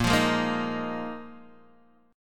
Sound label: Bb 7th Suspended 4th Bb down Guitar DADGAD